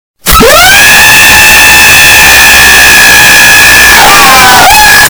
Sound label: ducky duck